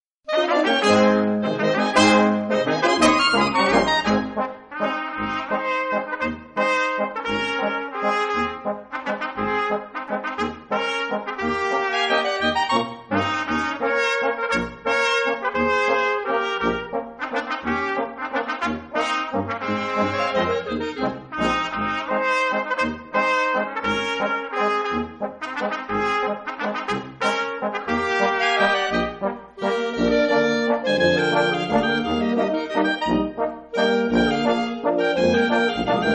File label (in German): Walzer, Kapelle